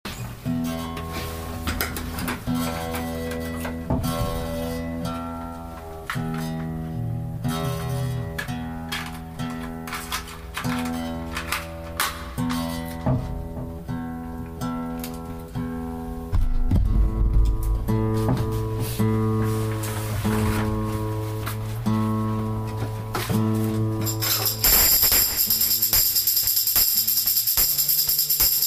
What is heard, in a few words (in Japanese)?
チューニング